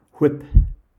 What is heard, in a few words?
whip